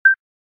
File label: Beep